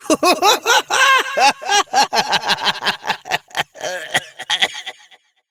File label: Evil Twin Doppelganger Laughter; Battle Barks; Warframe; EntratiDoppelganger